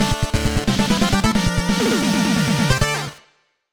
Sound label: Jungle Sting; Jump Gunners